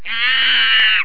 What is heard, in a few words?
Monkey scream